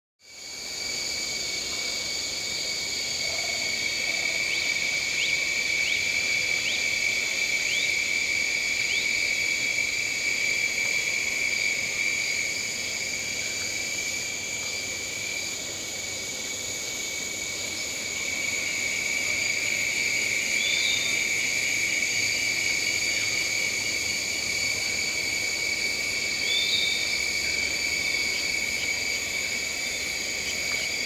crickets, birds; JERRY APPS WINTER

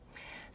Birds

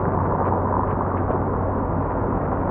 Car Road Noise; Poetry Project

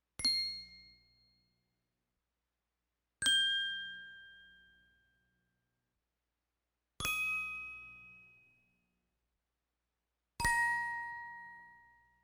Xylophone Bell Tone; Electa Quinney

